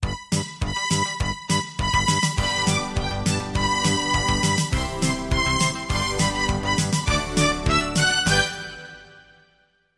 jingle bells

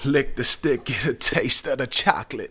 Rap Vox

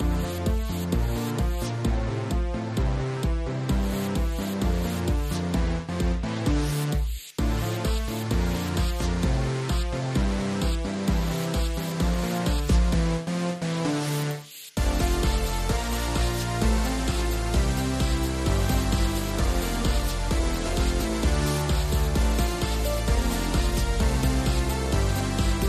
130bpm Alt Percussion

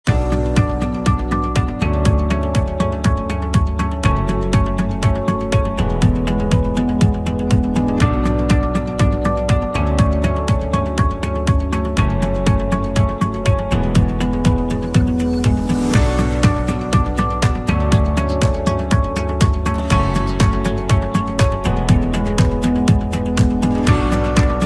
Corporate World loop

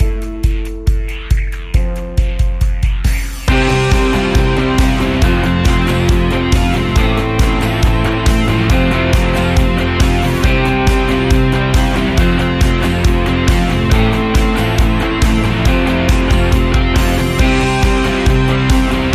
Modern Pop Rock Sound

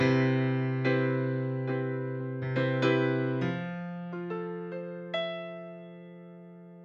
c major 7 grand piano jazz 140 bpm

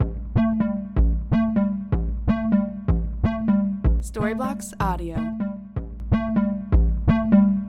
Ambient House Percussive Synth 125BPM A

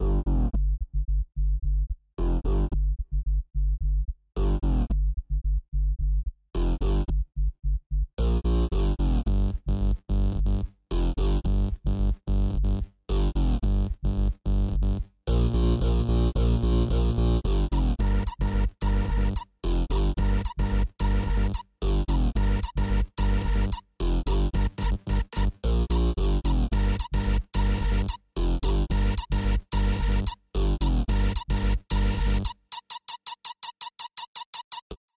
House Synth 110bpm

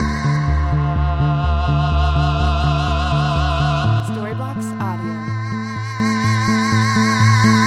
Ambient House Melody 125BPM D

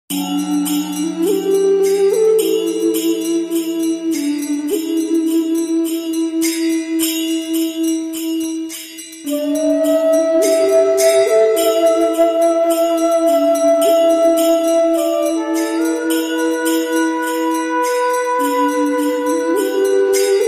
tibetan flute cue

